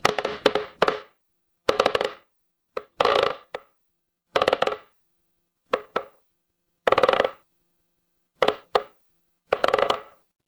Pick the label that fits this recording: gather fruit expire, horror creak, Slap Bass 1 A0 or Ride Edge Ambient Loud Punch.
horror creak